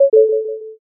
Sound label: error